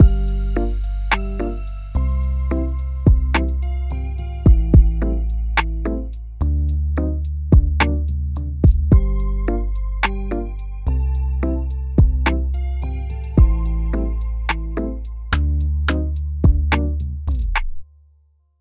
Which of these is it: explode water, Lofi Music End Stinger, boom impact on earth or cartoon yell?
Lofi Music End Stinger